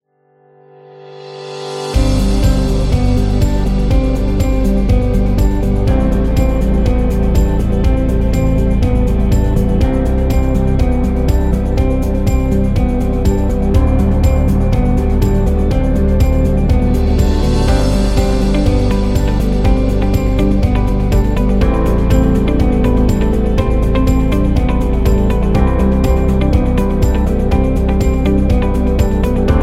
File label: Corporation; corporate background